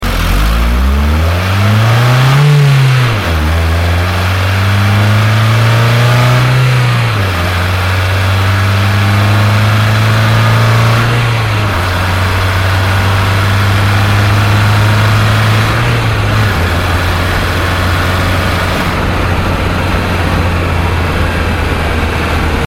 Inspira Acceleration Outside

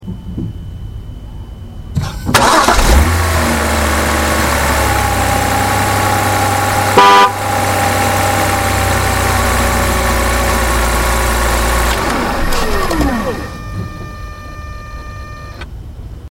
325i Coupe Ignition Horn Outside

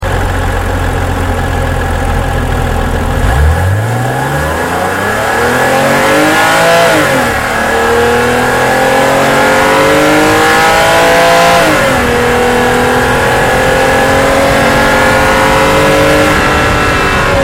Z4 Acceleration Outside

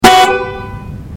Holden Commodore SV6 Horn Outside

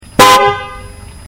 Mini Cooper Horn Outside

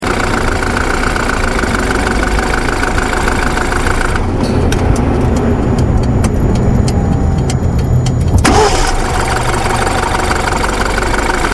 stop start outside

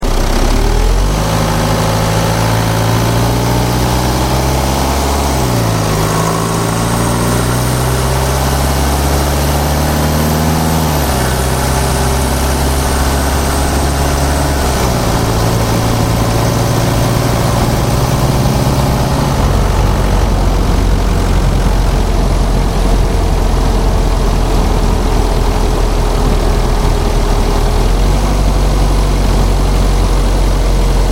320d Sports Normal Acceleration Outside

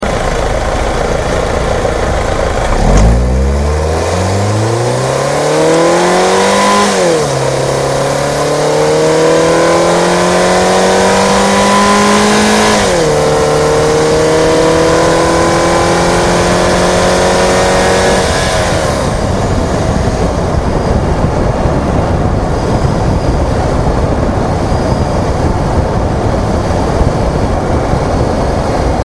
Mondeo Acceleration Outside

